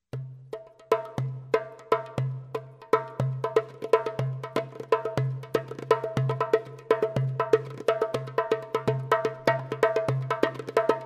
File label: darbuka